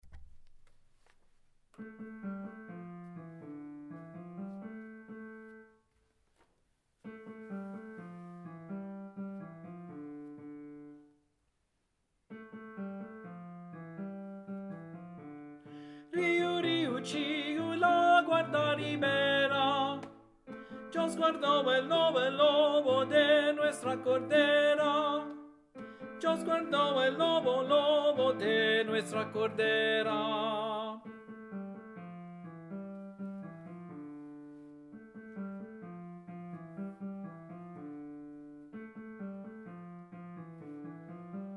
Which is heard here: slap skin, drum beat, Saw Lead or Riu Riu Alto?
Riu Riu Alto